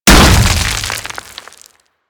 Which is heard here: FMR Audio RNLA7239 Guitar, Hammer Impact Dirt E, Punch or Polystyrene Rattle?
Hammer Impact Dirt E